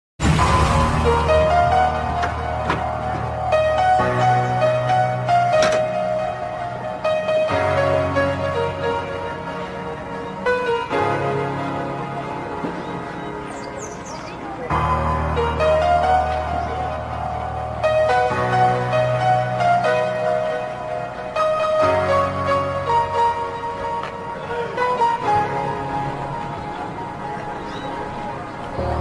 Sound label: piano